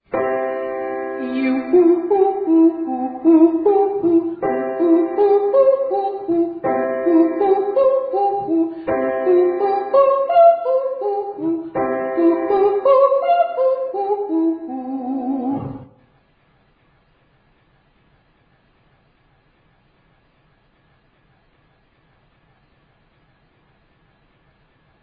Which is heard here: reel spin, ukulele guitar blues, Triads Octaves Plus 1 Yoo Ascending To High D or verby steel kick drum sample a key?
Triads Octaves Plus 1 Yoo Ascending To High D